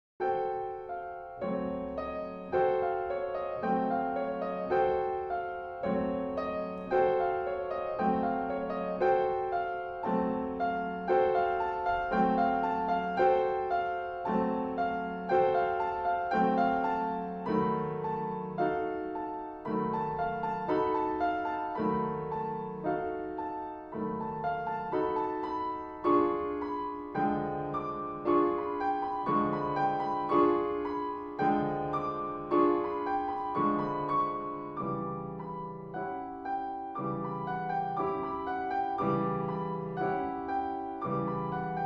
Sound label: piano pieces